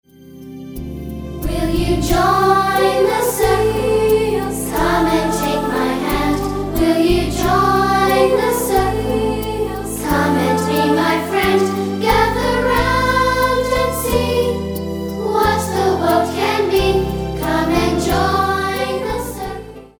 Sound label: Circle Song